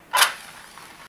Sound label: Match Strike Light